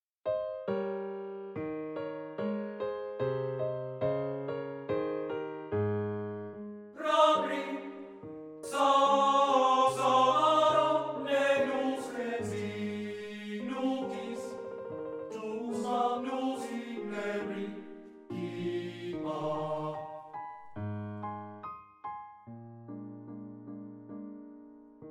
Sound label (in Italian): Lied per Canto e Pianoforte. Adagio e con affetto; Hanchen an Wilhelm